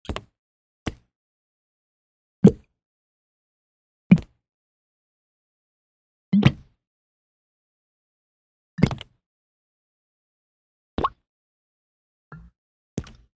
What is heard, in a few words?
food ketchup splats out of bottle various; sound effects one